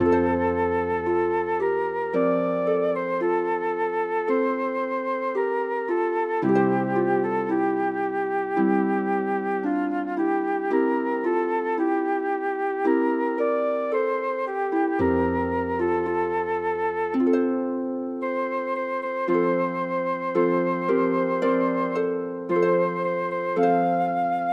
ave maria for flute harp

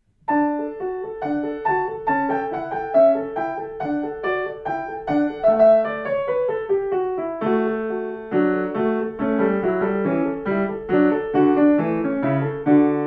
allegro

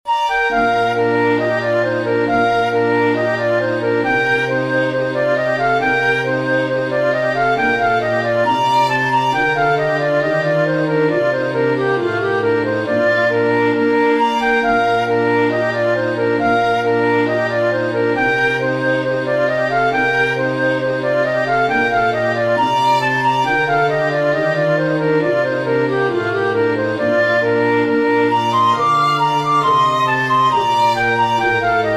planxty for flute strings

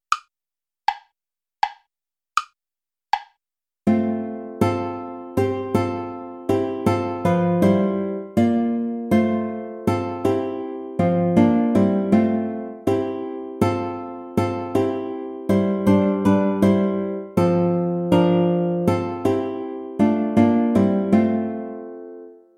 play along without guitar; herr jesu christ dich uns wend

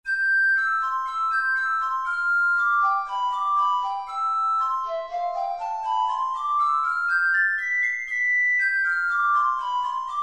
scale study; lewis alastair

